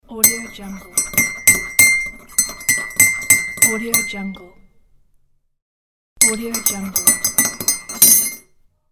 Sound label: spoon stirring coffee